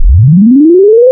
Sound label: Harmonic Series; Xfer Records Serum; Serum Presets